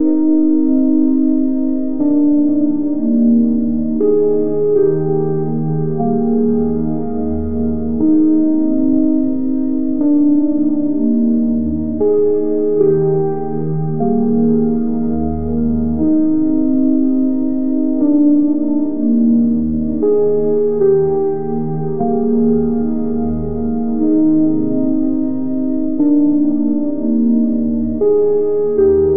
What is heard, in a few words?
style titl, smooth end